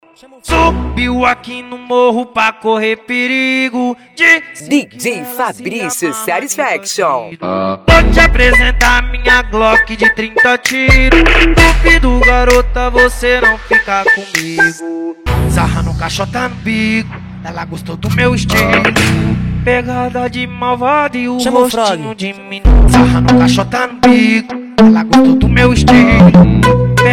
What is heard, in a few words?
trap e funk